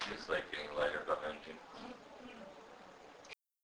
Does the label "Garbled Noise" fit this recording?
Yes